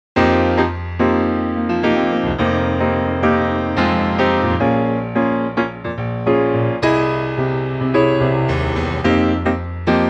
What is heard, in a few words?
independence Fm Gbm piano